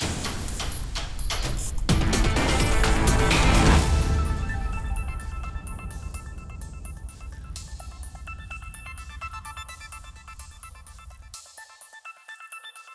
musique gameplay victory intro; gladiabots

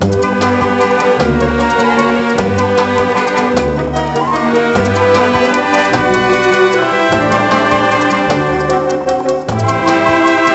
Valse

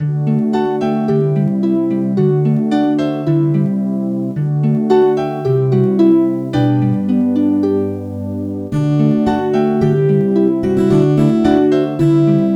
Music Calme; Emma at the Farm; nds

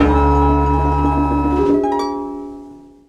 Puzzle Mysterieux

